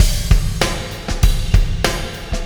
Beat, Processed Drumkits

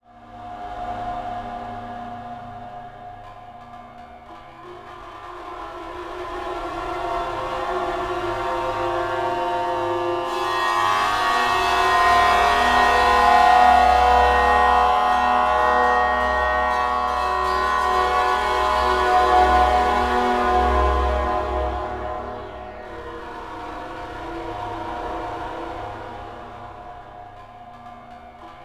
spooky; Zero G; Malice In Wonderland

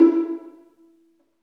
String Vlns Pizz

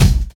AX MIX BD; AKAI S6000 CD-ROM - Volume 1; DRUM RYTHM

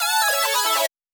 Lead

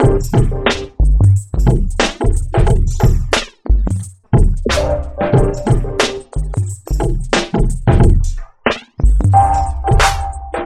a mixed bag 090bpm, Downbeat Dance Grooves; Zero-G

